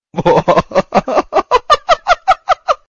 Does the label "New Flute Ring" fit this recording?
No